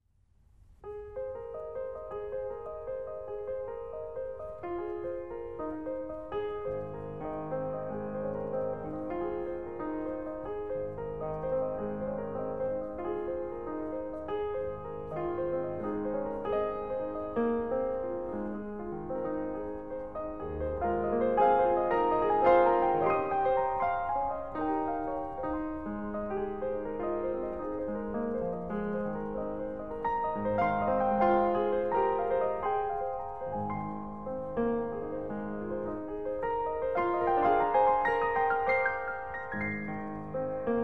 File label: AMPICO; Rach